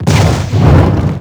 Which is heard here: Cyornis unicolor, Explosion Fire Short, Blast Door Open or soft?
Explosion Fire Short